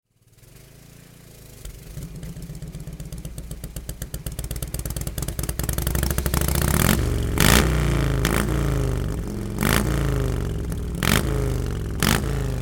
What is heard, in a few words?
Morgan Super Aero; 1931